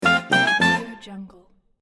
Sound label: upbeat points won